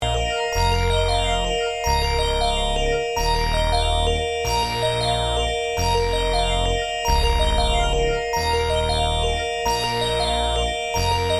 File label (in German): PPG Wave Computer 360 und Wave 2